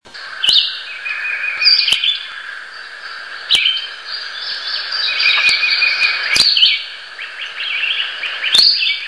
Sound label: Red-eyed Vireo